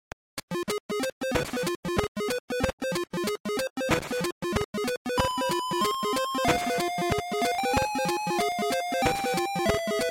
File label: Cave; Gex 3: Deep Pocket Gecko; GBC